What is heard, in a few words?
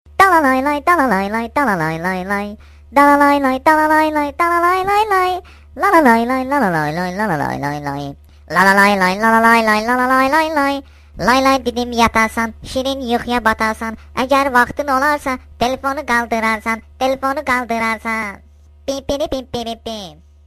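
Nokia Talk, ringtone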